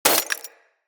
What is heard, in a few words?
Bullet Impact Debris Glass; Weapons